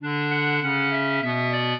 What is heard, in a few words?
clarinet; Mozart